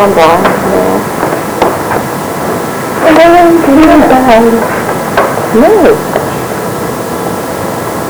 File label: singing and talk over; evp